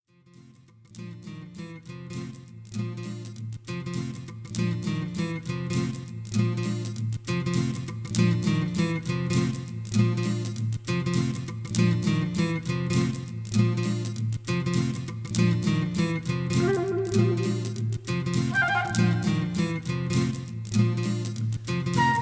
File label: flute; luciosflute